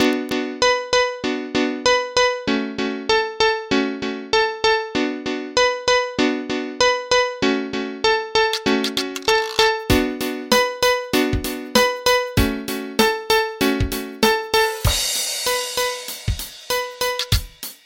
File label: Acoustic Grand Piano